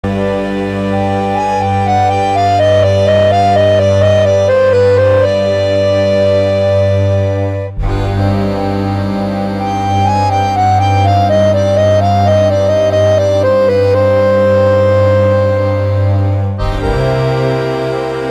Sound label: Adagio